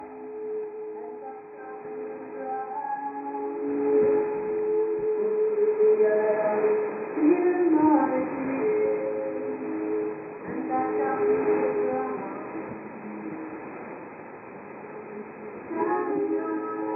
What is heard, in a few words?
9450 kHz